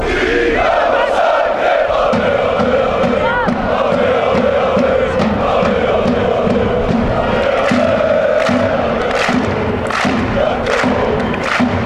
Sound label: dinamo zagreb ole ole, fanchants